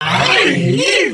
Stun, creatures organic

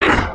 Run, creatures organic

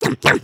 eat; emotes

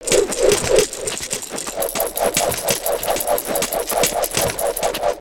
engineer spinjitsu, creatures organic; Skullken; aronwk collection; ndaudio

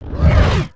asteroid whooshby; ambience

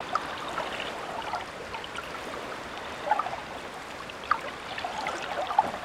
small pond; ambience

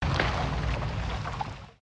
rubble; creatures organic

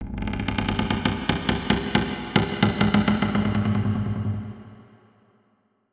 Cave Wood Creek; ambience ninjago

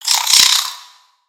Spider Angry; creatures mechanical; Lego

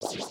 Change Hair, foley

